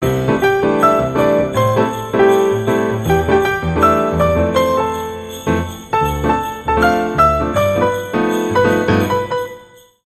Jingle Bells

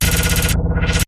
Glitch Hardrive Misstep

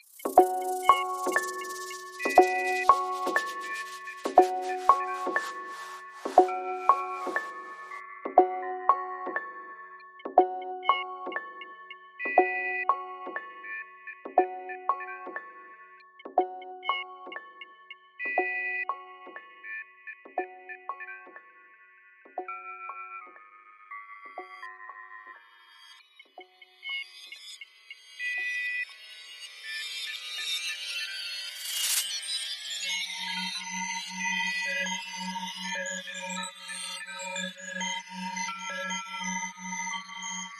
Underscore Transitions

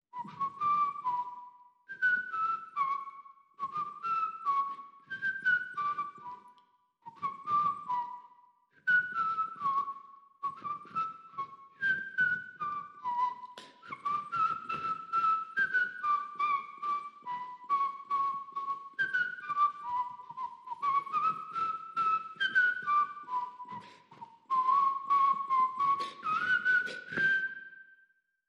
Whistle Clean

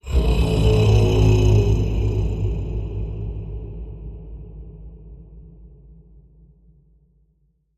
Predator Deep Bellow Bend

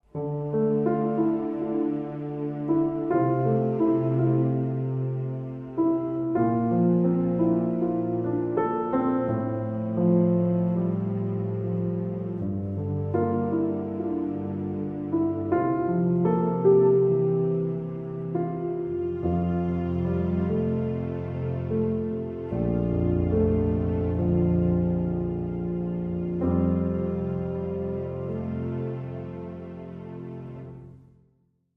With Strings

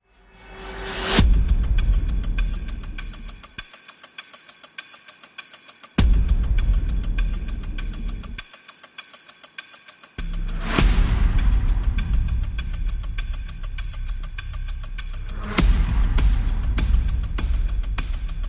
Percussion and FX

